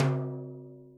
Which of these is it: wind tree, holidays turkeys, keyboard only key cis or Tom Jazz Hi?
Tom Jazz Hi